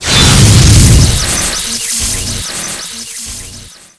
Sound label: Thunder Bolt shock